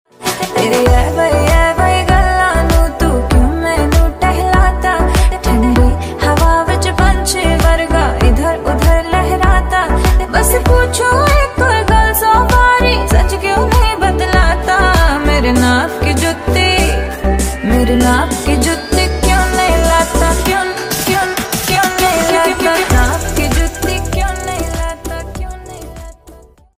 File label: song ringtone